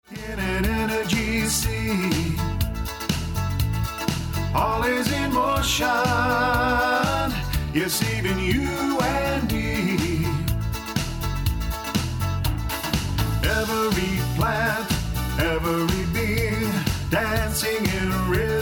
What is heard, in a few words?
Vibration